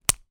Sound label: household medicine tablet large remove pop out of foil blister pack; zapsplat